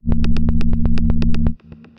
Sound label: Pulse, Synth Rhythmic; Menke; Ableton; Glitch and Wash